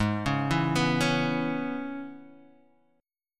Db Minor 7th Ab, chord

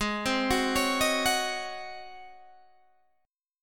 Ab 11th down Guitar Open G

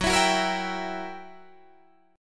Gb 7th Flat 9th Gb Guitar Open D

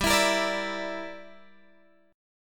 G Minor 6th, Guitar Drop D

